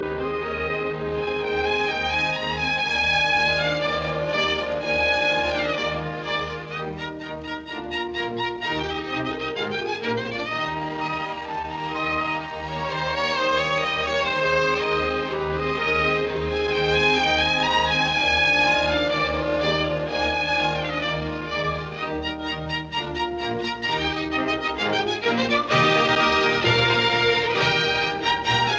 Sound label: Piano Concerto No 22 in E flat major K 482 I Allegro; Wilhelm Furtwangler